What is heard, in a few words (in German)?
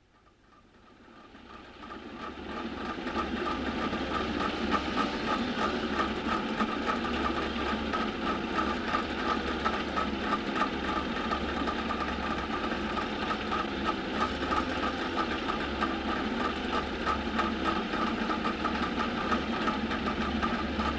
Trabi